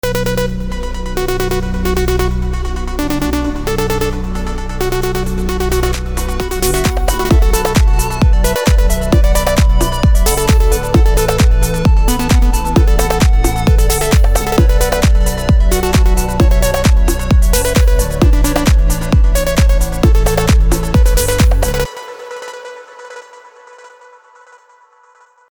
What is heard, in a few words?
LD MelTech Leads; Vengeance Sound Avenger 2; Generative 1